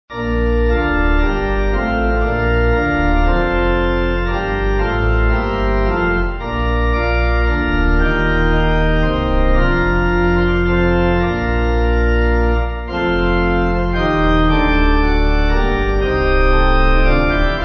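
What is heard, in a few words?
Pipe Organ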